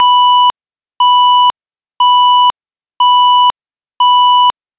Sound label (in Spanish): Tono Intermitente 1000Hz, sirena electrónica; sirena electronica 30 sonidos seleccionables